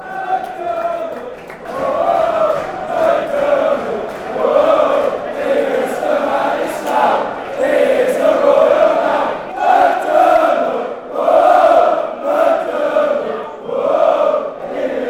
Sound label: reading chant, fanchants